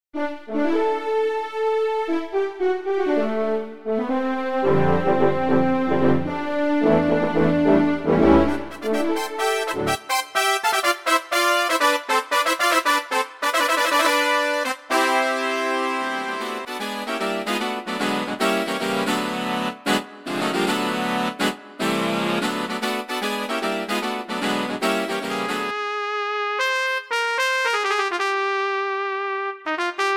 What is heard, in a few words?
brass